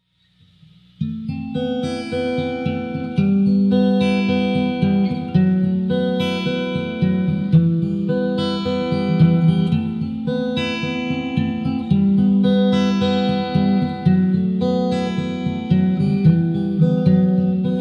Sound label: Guitar Instrumentals